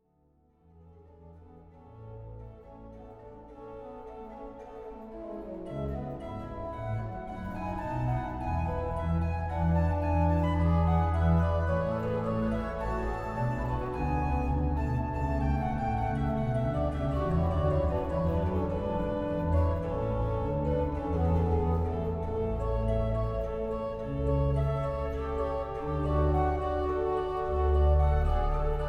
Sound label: Trio BWV 545b